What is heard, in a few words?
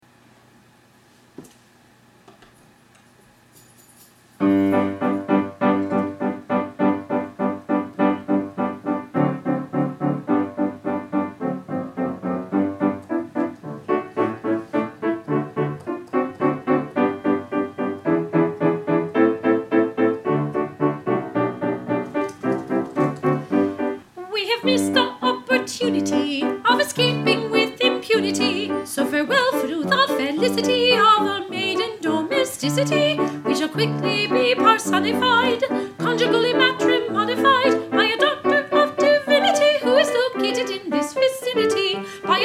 soprano; Pirates Penzance Sudbury